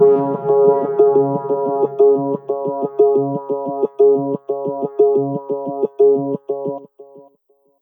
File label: SPD SX